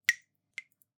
Drips

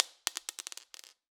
Drop On Floor, Medium Wine Glass

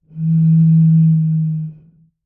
Bottle Blown

